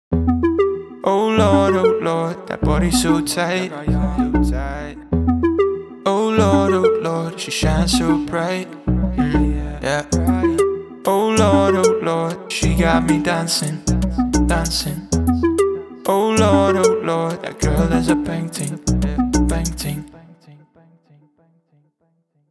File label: With Hook